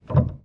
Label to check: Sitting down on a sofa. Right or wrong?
Wrong